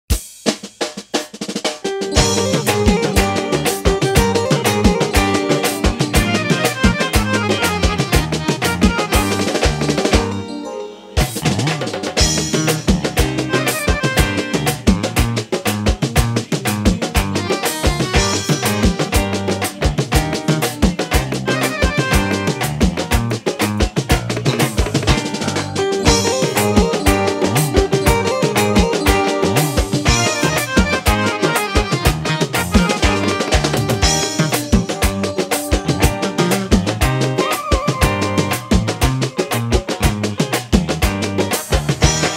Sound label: Live Sinhala No Voice Karaoke Track